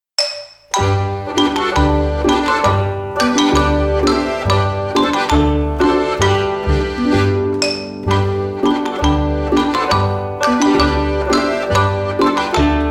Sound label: polka